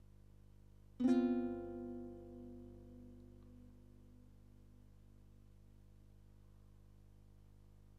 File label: guitar